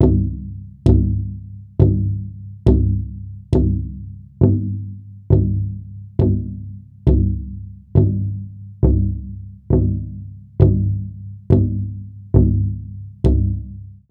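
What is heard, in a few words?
Big Drum sheepskin mallet; Sneak Up (Gittantmeah)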